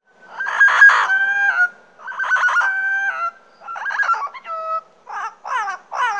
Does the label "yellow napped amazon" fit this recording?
Yes